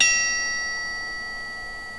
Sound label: CHIME, SHIP BELL